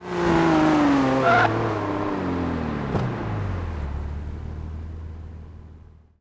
throttle off, vehicles cars; v12vantage; tdmcars

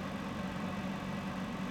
office projector fan; soundscapes

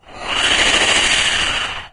breath, imp; doom3

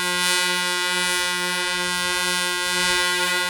truck horn actros; vehicles; lwcars; horns

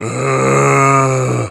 zombie idle

